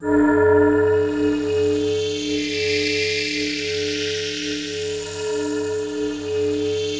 athmo mono

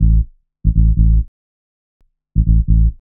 ELECTRO BASS 140 A

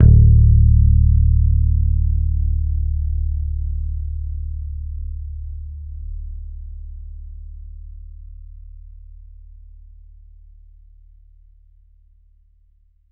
T-BIRD BASS PICK D1; Bass Mace; DirectWave

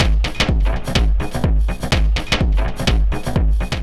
DEEPHOUSE BEATS 125

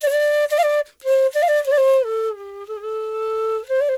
ETHNO EXTRA 90; ethno2

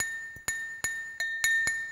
bottle, ethno, 125

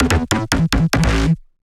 Bass

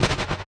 Snare, One Shots, Drums; Touch Loops; BlissedOutBeats

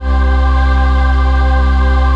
PAD; PADS 2

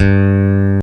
FINGER G-3, ELECTRIC BASS

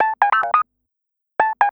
TECHNO SYNTH 140; Keys

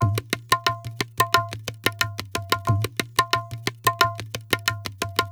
ETHNO EXTRA 90; ethno2

